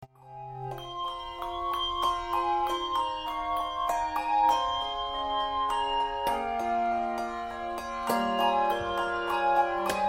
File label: valse